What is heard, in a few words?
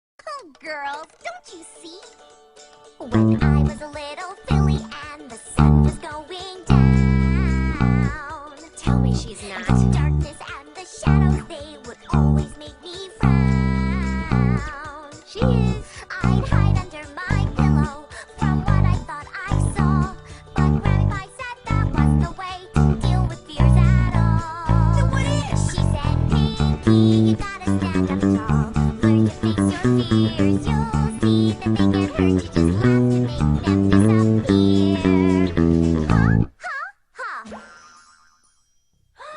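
Bass Cover; My Little Mashups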